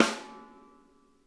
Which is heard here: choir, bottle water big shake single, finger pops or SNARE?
SNARE